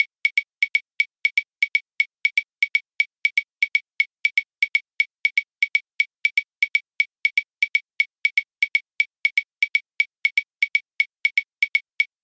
makuta cata

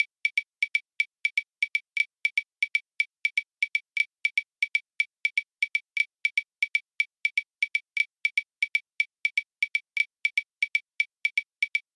yuka cata

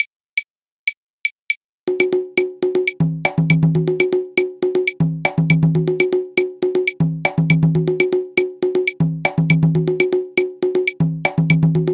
mozambique conga; Le Mozambique est une musique de carnaval; Le Mozambique est une musique de carnaval créé en 1963 par Pedro 'Pello el Afrokán'

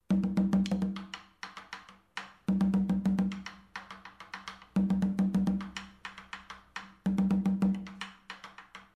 congo laye caja

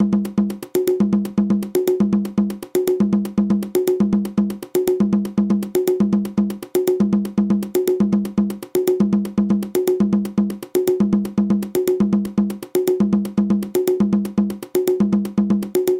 chaonda conga

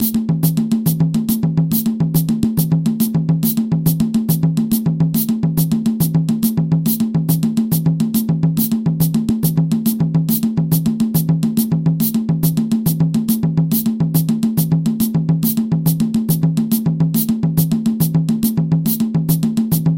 abakua havane kuchi yerema

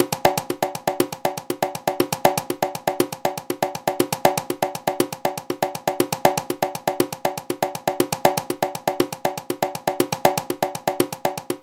songo bota conga

